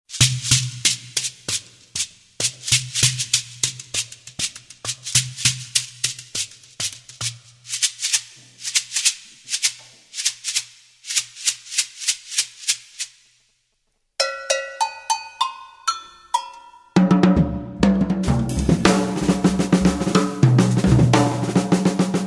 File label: Bang Bang Boom Boom Bap Bap, drum